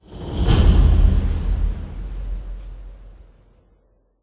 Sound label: location unlock